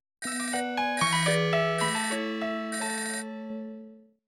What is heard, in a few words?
Nokia tune